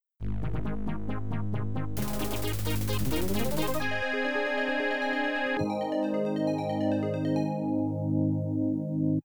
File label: Fanfare, IBM-PC SB Pro2; Hocus Pocus; Apogee